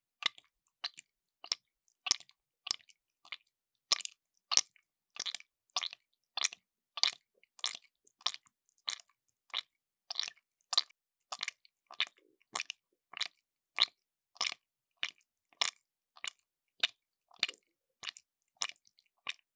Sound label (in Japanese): クチュ音 スロー